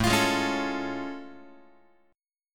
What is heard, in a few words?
A Augmented Major 7th A Guitar; strummed; JGuitar